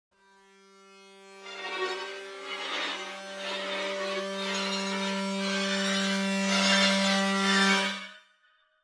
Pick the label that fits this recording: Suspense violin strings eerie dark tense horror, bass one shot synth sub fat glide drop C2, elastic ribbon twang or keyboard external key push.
Suspense violin strings eerie dark tense horror